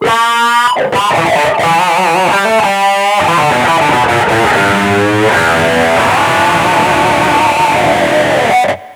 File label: guitar